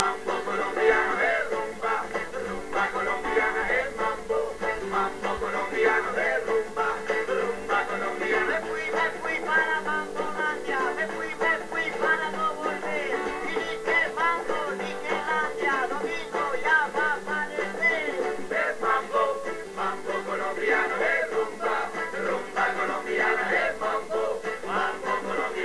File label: mambo